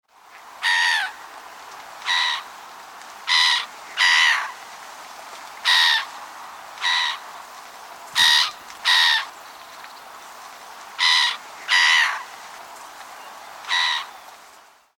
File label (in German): Lachmoewe Rufe